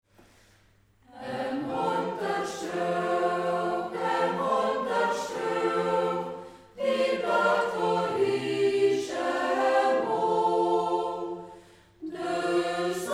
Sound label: chor, Zied; Ortsmundart: Zied; Äm Honterstreoch; Zieder Chor; Hegt wird gesangen